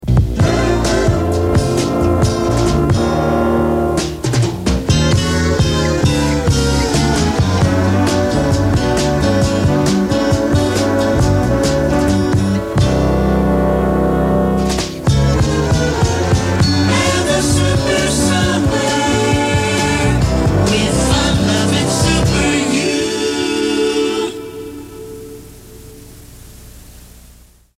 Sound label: Jingle; WYOO; Super Summer